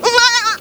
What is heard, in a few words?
goat mono